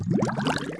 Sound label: win Aquarium Restore Up